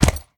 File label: pet footstep; smite; lancelot